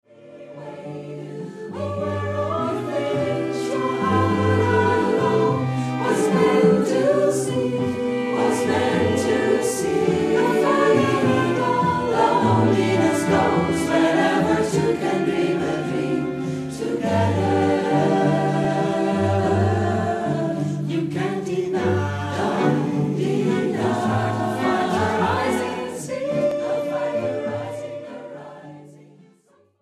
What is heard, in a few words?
ssaatb